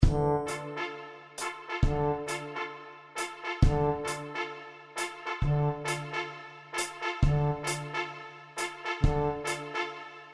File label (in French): Tuba avec trompette